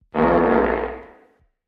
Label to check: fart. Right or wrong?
Right